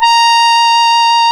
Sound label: A#, Synclav FM, BRASS; Big Fish Audio